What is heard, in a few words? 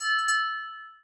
emailtone; Jolla